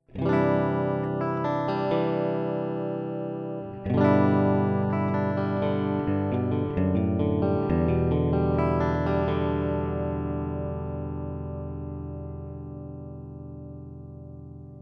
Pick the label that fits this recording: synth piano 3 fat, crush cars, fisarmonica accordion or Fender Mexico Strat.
Fender Mexico Strat